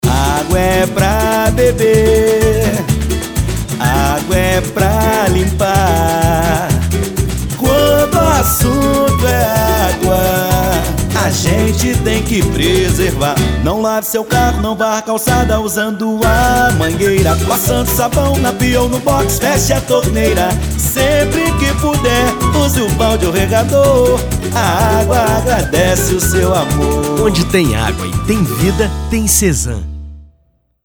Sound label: jingle; CESAN